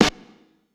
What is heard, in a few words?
Snare; Sonic Foundry (Sony Creative Software); Stylus Pressure Urban Grooves on Digital Wax; Summer Jam